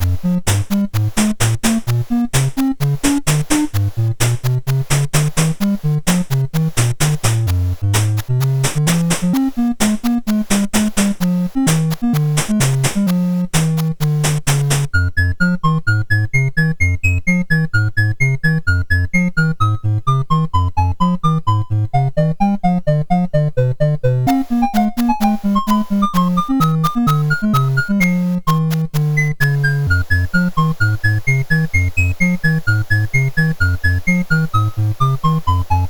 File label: sid MOS6581R4, GAMES; Accolades Comics